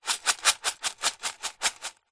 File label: foley stones small handful in small metal tin shake toss